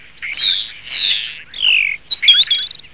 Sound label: Sturnus unicolor